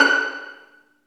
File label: String Vlns Pizz